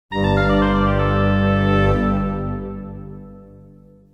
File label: ball drain, Pinball; Pure Pinball